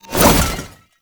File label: Cannister Throw Toss; Enemies; Abilities; Warframe; Tau; Dax Archer